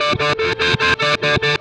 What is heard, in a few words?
Processed Guitar, Loops; Guitars